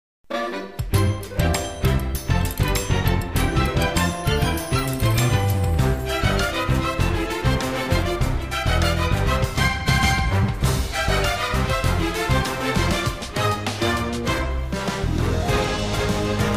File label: level complete; mario